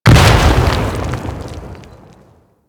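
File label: Mortar Projectile Explosion; Warframe; Venus; Venus Terra Mortar Moa; Abilities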